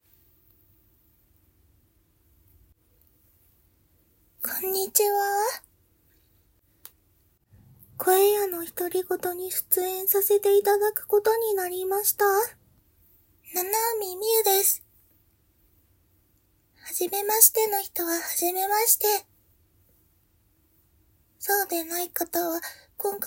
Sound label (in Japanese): プライベートオナニー実演; 声屋のひとりごと